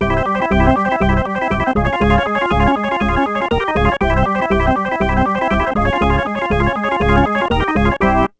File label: Ditty; NBA ShootOut 2001; PS1